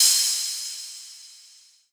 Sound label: cymbal clean, Hip-Hop; Drum One Shots; SD CAICOS Hip-Hop Sample Pack; Slate